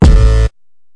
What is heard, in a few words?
hard kick synth